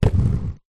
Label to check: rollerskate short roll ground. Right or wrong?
Right